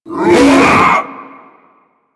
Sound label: Infested Jetpack Attack Melee Swipe; Warframe